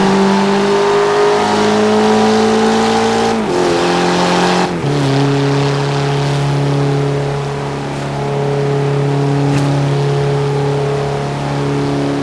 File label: fourth cruise, vehicles; gmod; tdmcars; mitsu evo x